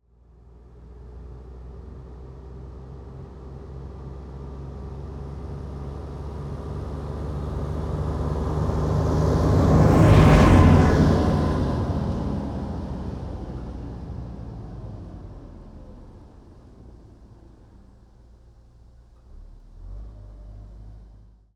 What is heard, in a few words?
excavator MF 860 exterior engine passby medium speed constant rpm; stereo M10; SoundHolder